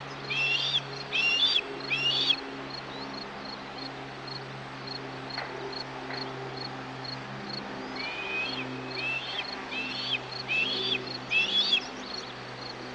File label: bird